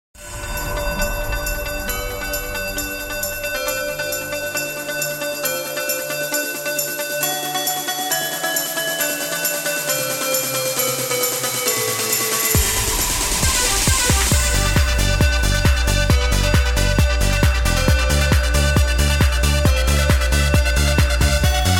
zombie wonderland

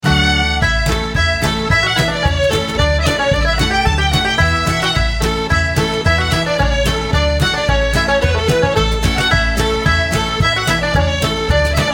irish jig

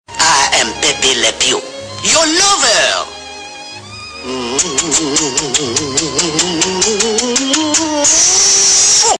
kiss; pepe le pew

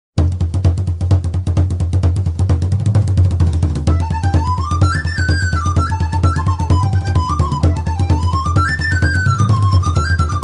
irish tavern

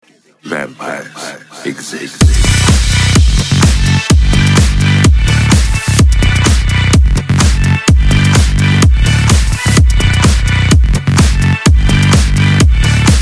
vampires exist techno

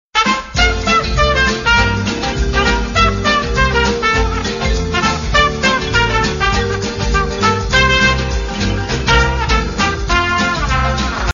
ringtone

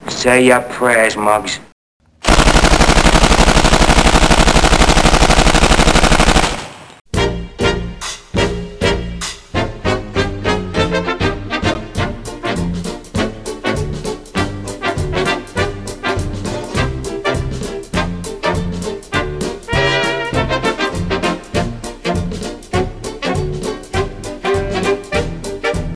roaring 20s

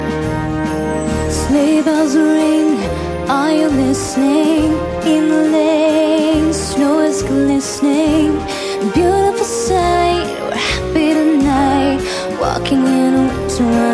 vocal

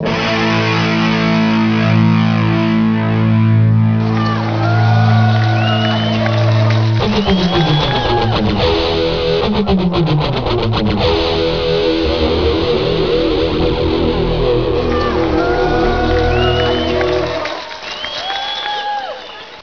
guitarz

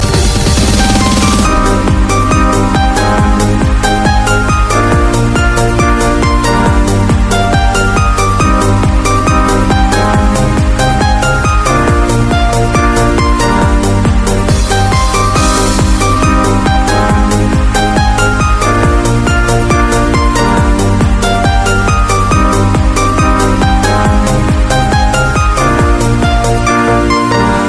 trance ringtone nice

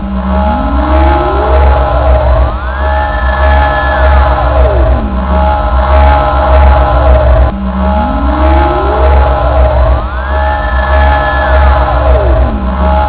ancient saucer

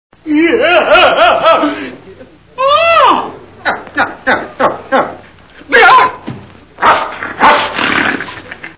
growl; curly nuk

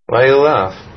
laff; borat yu

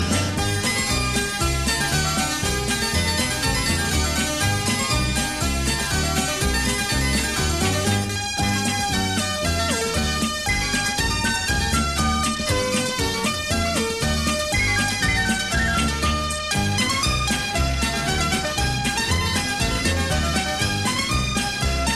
ringtone

